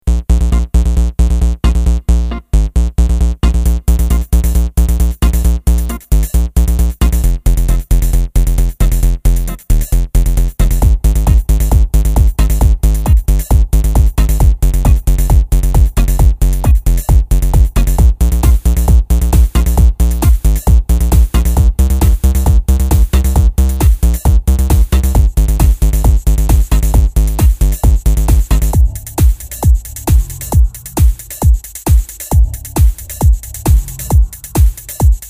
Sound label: Electronic F Electrohorse; Unclassified Electronic